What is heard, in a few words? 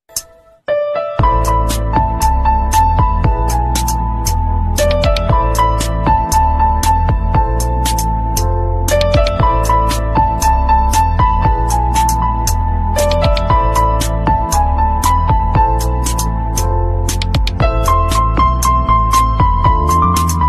Mobile Ringtone